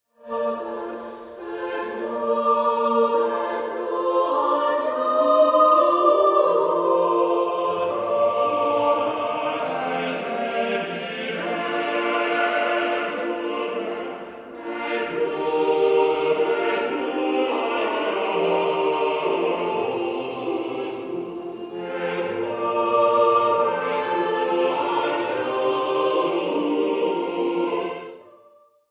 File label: canite tuba, choir; Giovanni Pierluigi da Palestrina; Westminster Cathedral Choir